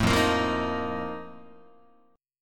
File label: F 11th F, down, Guitar; F11 strummed